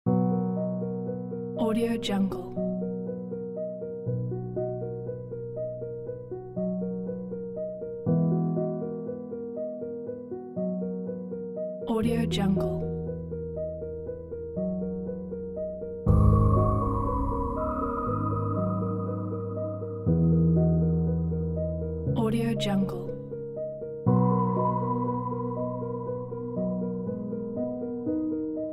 intimate sentimental moments

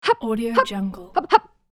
female jump sound

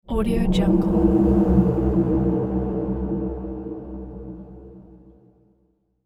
dark suspense swell; sound ideas

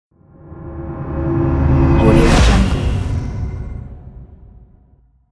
horror action opener logo

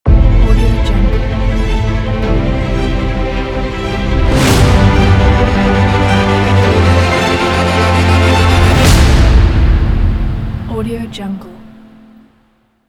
epic intro ident

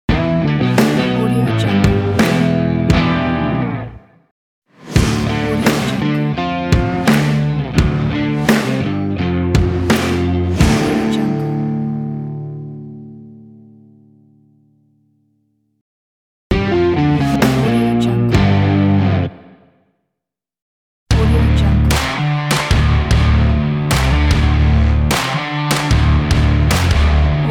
indie rock logo pack

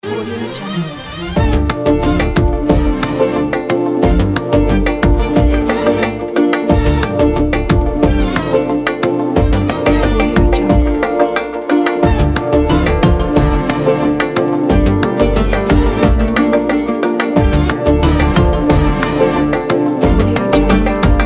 the optimistic oriental

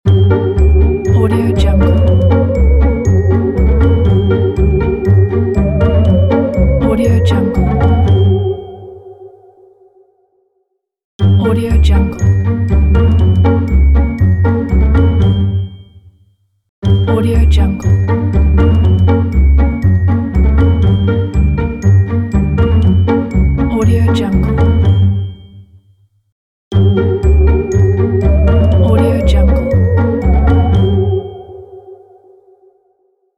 halloween ident; cap-studio

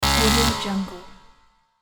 buzz

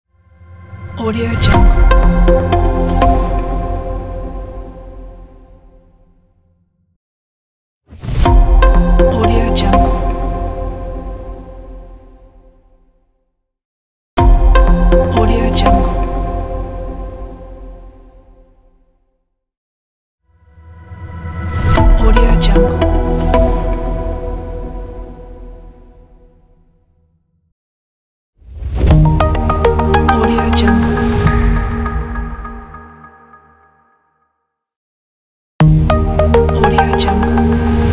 logo in pack; boxbeats

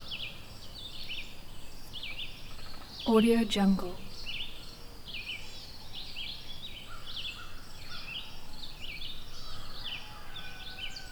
forest birds upstate new york deep woods dawn; prosoundfx

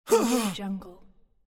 male groan pain

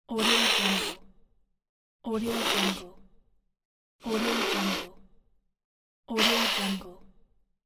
cat hiss

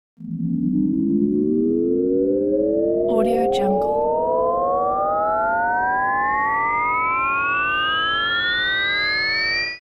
rising multipitched smooth tones

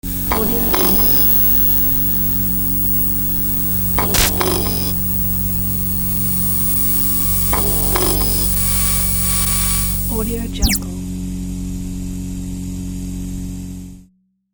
neon light fx

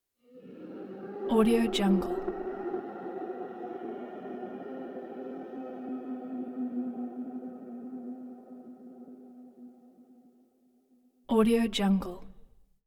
demon moaning hollow reverberant ghost spirit

